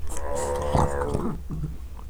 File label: moan loop; shamblie; npc